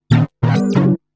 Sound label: TECHNO SYNTH 140; Keys